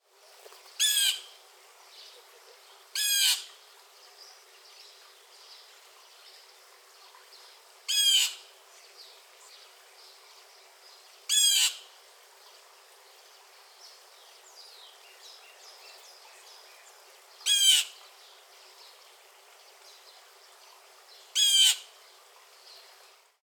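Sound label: Blue Jay; StL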